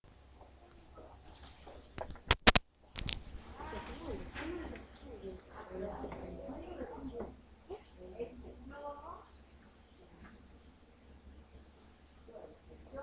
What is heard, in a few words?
laugh